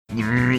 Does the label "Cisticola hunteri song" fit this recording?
No